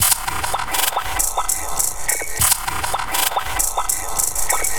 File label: Undersea Shaker Beat, Electronic Beats; Sonic Foundry (Sony Creative Software); Myths of Technology